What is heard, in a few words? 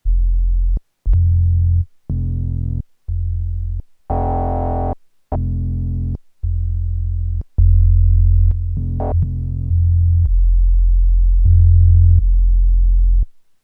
Behringer UB XaD Atrophy Vintage other Sound